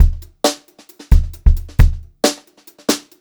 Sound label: Brushed Drum Loops